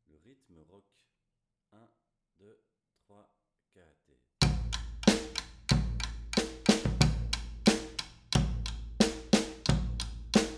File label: Rock, Batucada